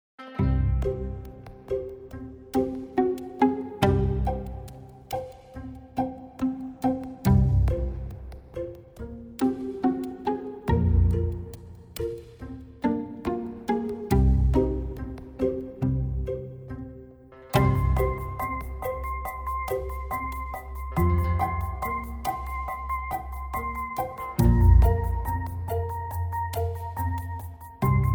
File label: Crawling Creatures reduced